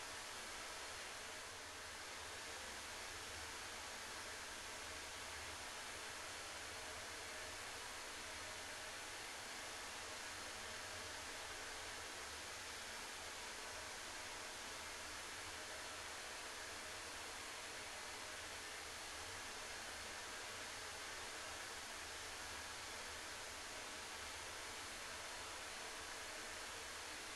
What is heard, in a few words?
banya ambient steam